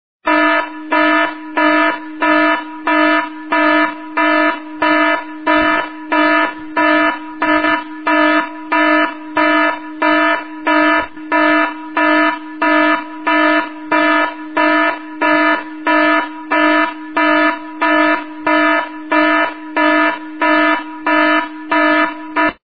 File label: Emergency Siren